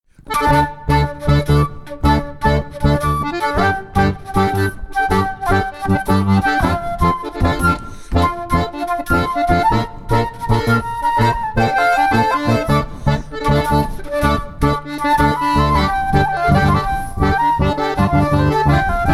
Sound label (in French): plinn ton doubl; plinn l'ancien ton doubl